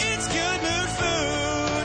Good Mood

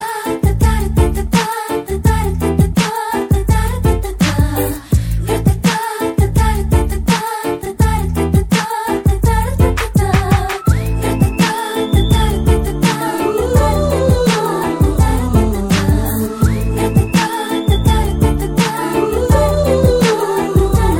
melody

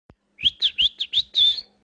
Whistle SMS

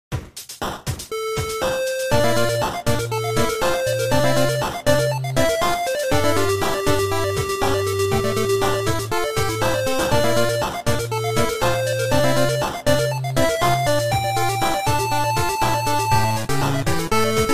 8 Bit

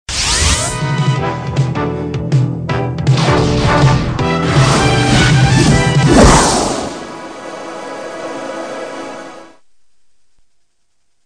breaking news sms; ringtones

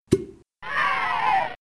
Grunt; Grunt Birthday Party

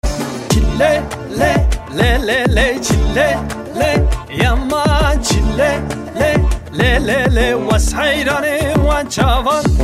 kurtce halay, ringtones; alternative

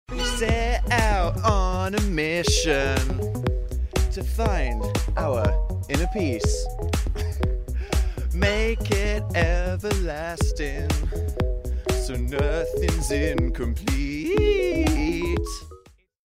Singing

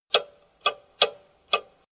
Tick Tack

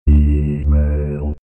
Droid Email

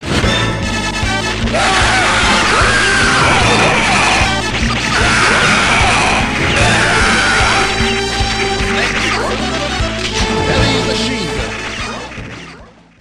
sms tone; metal slug

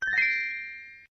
coin; maplestory

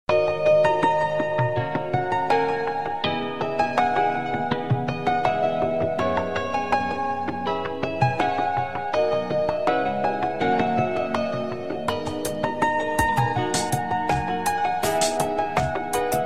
Soft Piano Tone

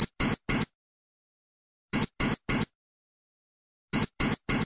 loud beep tone; ringtones; sms

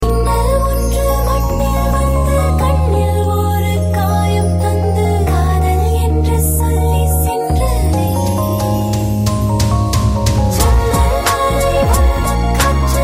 best love song

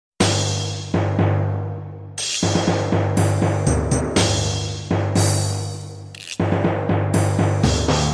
Drums

